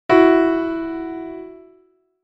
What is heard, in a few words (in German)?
Intervalle simultan